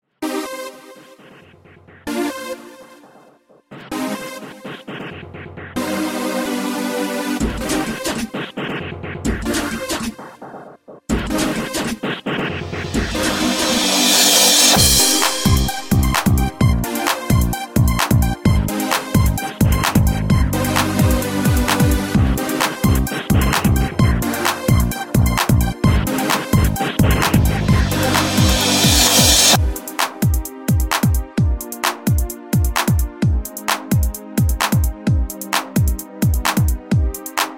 funk melody

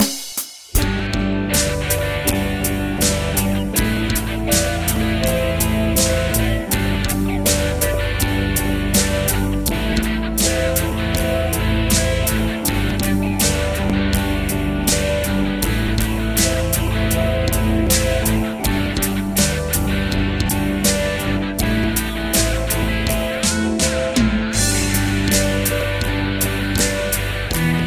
high harmonica feat hum

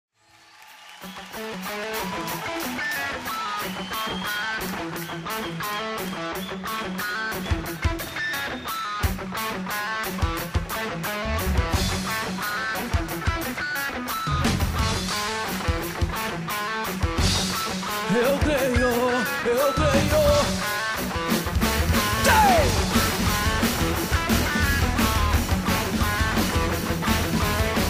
solo

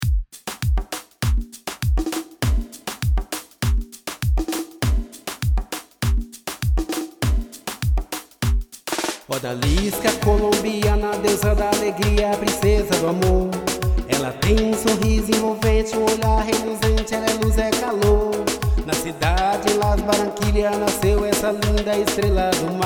brazilian beat

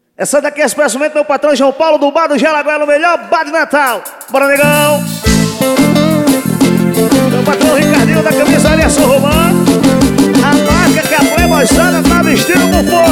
forro; promocional de janeiro2012; wl studio movel

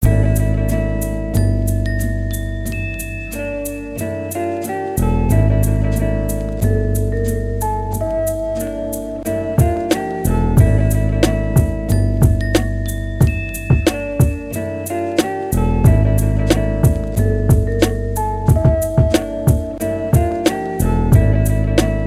urbano instrumental

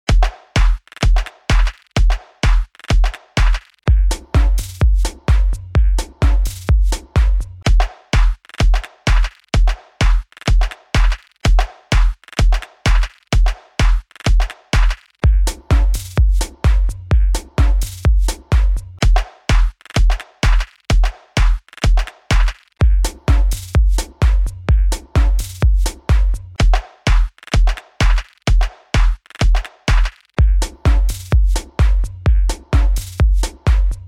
grav bass trip

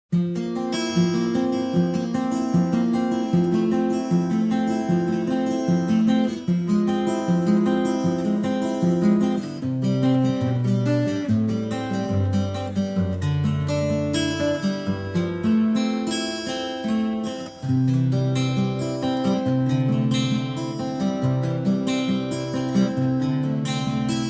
blues

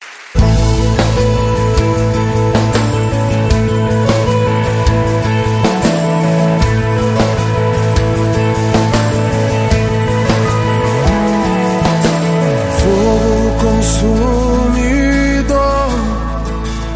gospel

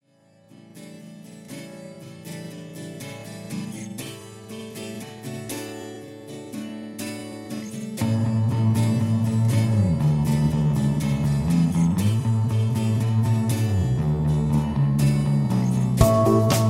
instrumental

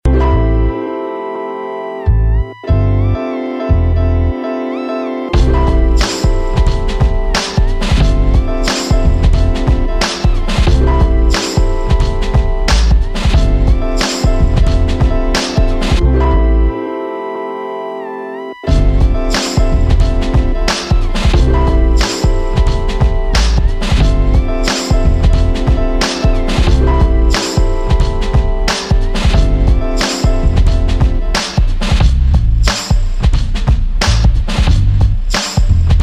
90s boom bap

